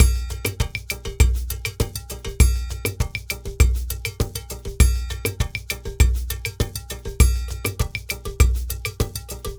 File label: Ensemble, Latin Percussion